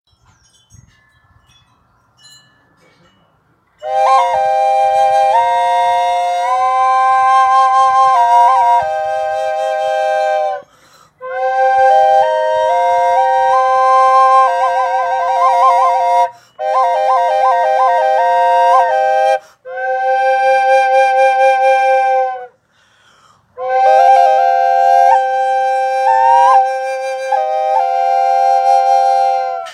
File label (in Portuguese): Mini Flauta Tripla Cm